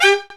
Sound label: Brass Stab; CMI